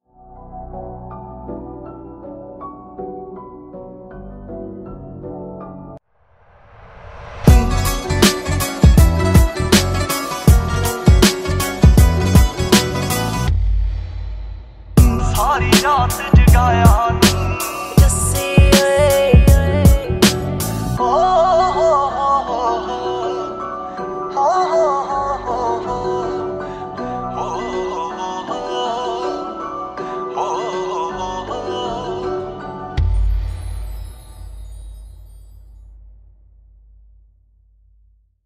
Punjabi Dj Song

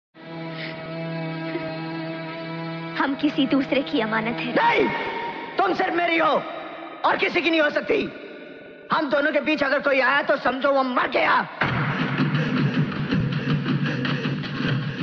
Punjabi Song

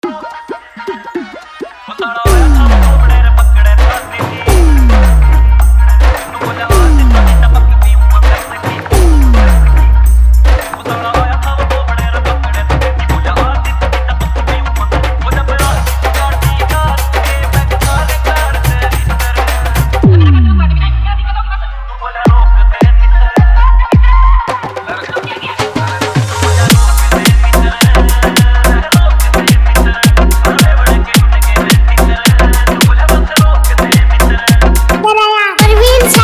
Hard Bass Remix Song

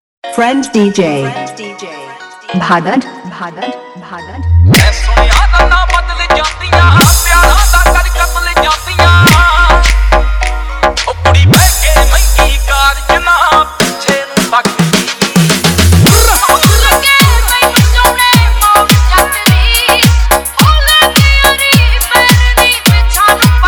Remix Punjabi Dj Song

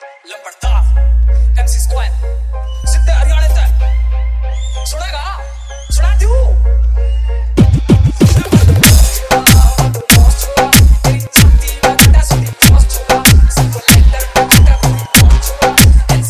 Dj Remix Song

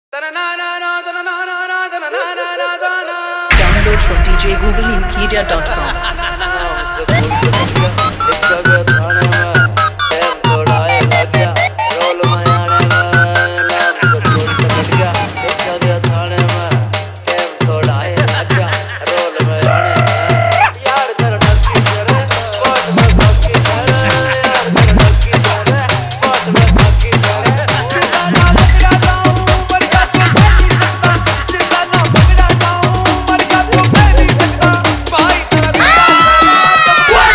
Siren Bass Remix

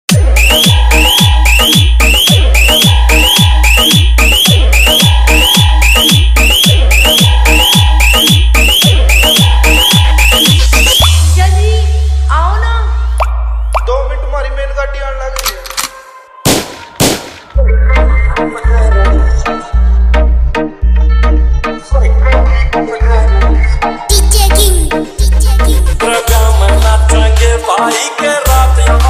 Dj Remix Hard Bass Vibration Mix

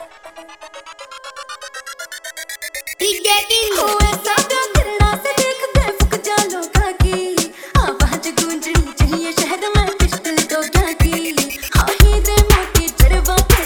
Remix

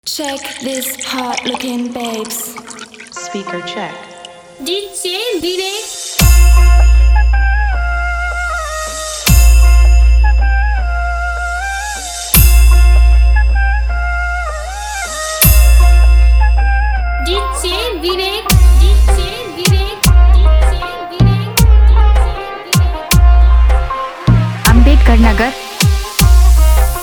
Sound Check Hard Vibration Mix; Special Mahashivratri Dj Song

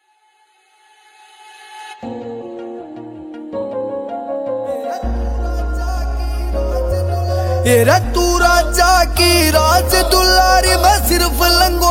New Haryanvi

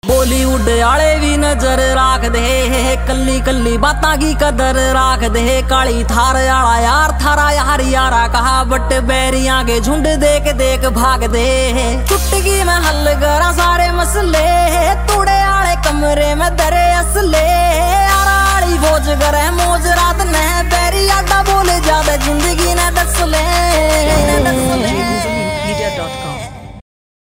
Ringtone

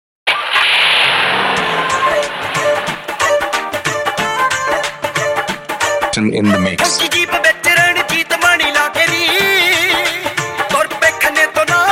Dhol Remix Song

